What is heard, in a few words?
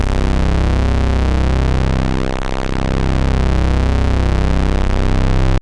Low Bass C1, Moog Voyager; Moog Voyager Sounds; VES1 Low Bass